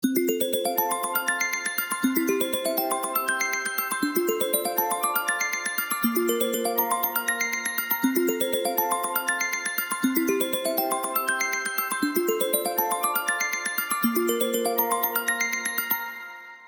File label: iPhone 12 Mini, tono de llamada